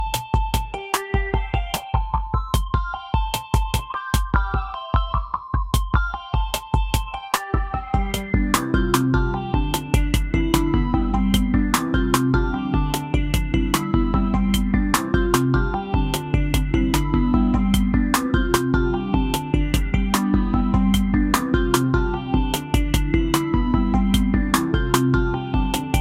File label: a light hearted soft and, background music